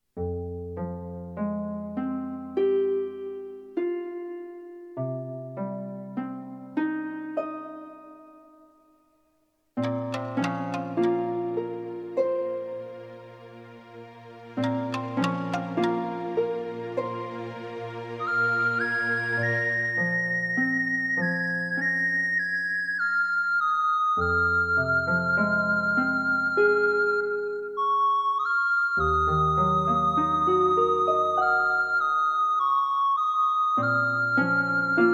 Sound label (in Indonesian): instrumen